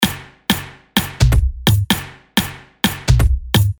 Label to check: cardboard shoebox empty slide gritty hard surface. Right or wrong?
Wrong